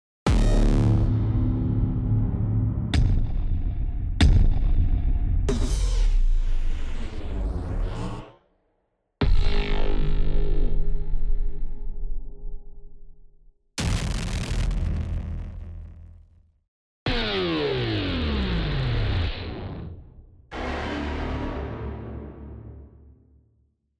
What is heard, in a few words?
Impact FX